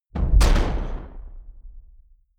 sci-fi space gun zing; pistolen gewehre

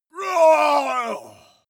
male groan painful

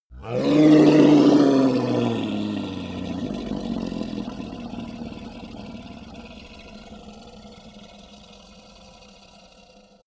hellhound roar growl long; kreaturen

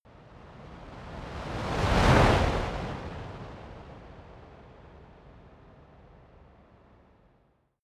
fire torch bright slow long flutter whoosh; swooshes-swishes

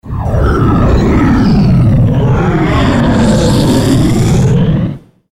noise deep growling; kreaturen; comedy-cartoon; soundpool

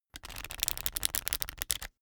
pack of cards shuffle table

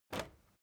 step crowd march quiet single left; schritte

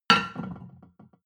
plate on plate place medium, restaurant cafe

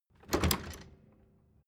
door flap loft impact

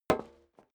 step dress boots metal dry single right; schritte; Footsteps; menschen

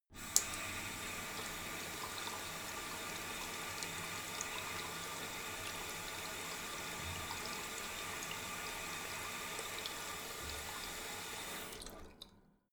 tap flow light long; haus garten; badezimmer

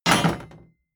wood impact rattle

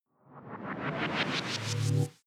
sci-fi boot incoming; swooshes-swishes

